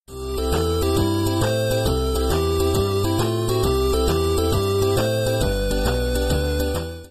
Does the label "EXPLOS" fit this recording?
No